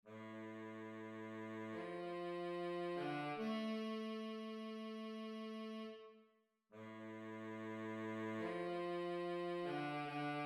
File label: Baritone Saxophone